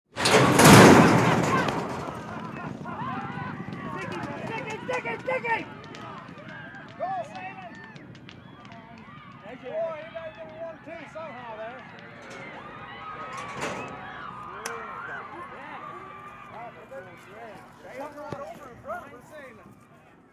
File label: Horse Race Sequence